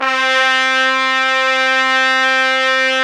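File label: trumpets section; Roland L-CDX-03 Disk 2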